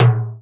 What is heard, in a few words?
Tom, Percussion; Vengeance Samples